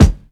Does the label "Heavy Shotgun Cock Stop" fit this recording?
No